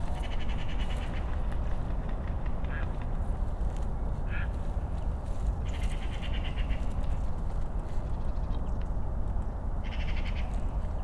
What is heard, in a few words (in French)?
AMB nature oiseaux